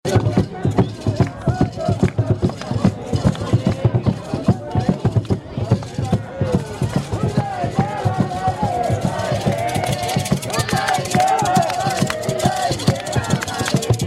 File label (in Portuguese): jongo de guaratingueta tambu